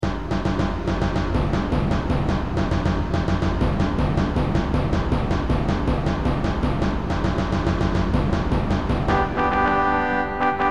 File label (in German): zirkusmusik fanfare; vollst fassung